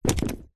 foley balloon filled water small drop carpet bounce; zapsplat